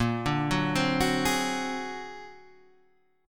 A sharp Augmented 9th; A#+9 Chord {6 5 4 5 7 4} arpeggiated

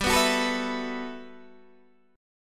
G Major 13th, Guitar Open D

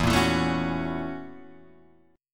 Gb 7th Sharp 9th E, guitar

